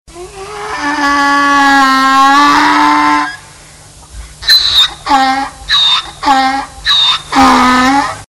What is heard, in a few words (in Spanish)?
Burro - Donkey